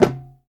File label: tom drum single hit c key, Royality free tom drum tuned to the C note. Loudest frequency: 564Hz